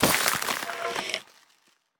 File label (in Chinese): 转化声; 流浪者